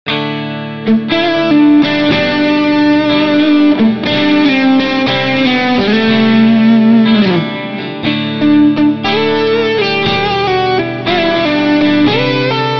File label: AMP Ballad; Studio Devil Amp Modeller Pro v.1.0